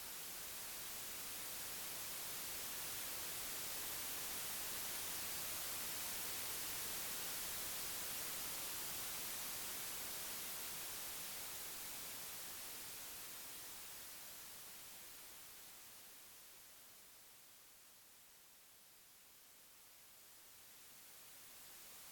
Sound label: oscilating white noise